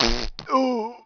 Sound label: Grunt; TR3; Playable Tutorial Level